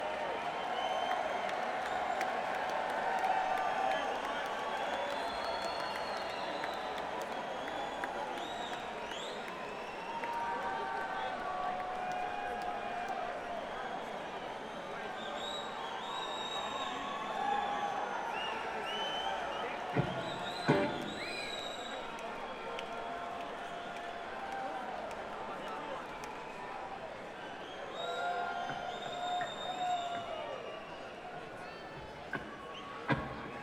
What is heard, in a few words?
Crowd, Alpine Valley Music Theatre; East Troy, WI